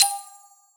confirm medium, menu

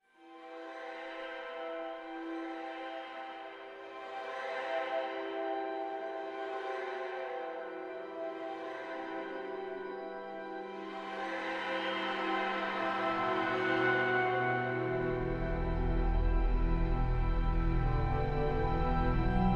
score Desert; Journey

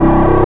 Sandblaster C0, synths; Ableton Live 12 Lite; Core Library

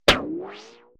result, dice; There; ThereClient

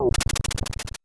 Soldier Dash; Enemy; Open Zelda; Quest Designer 2.0.4b

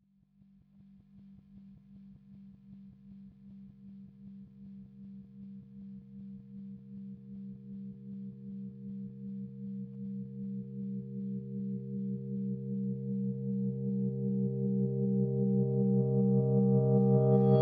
E-Piano Reversed Cmin7; Piano & Keys

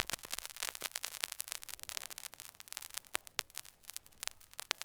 Vinyl Dirt, Texture; Loops; Ableton Live 12 Lite; Core Library

